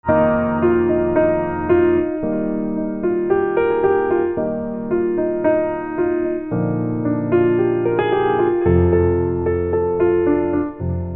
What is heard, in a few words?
Piano